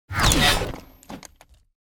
swing, sword; bane

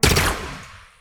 shot

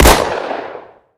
pistol fire; m6h2; unsc

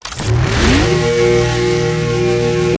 charge, pistol; ppistol

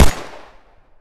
gunfire; weapons; br85

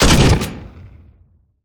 fire, weapons

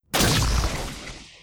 shot

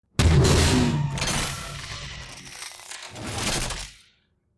shot

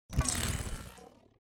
out, sword; bane

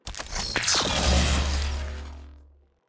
equip, sword; bane

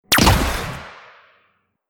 fire, weapons; light rifle

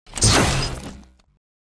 impact, sword; bane

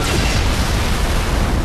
onager fire

